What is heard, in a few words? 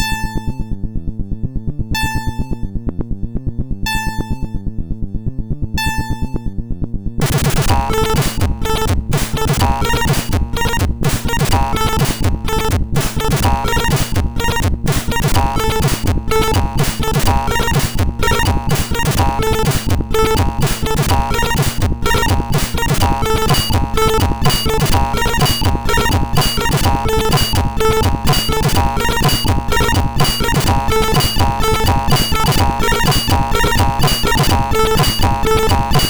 sid MOS6581R4; Hard Work